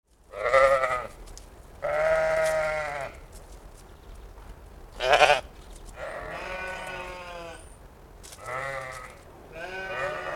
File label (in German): Schaf ostfries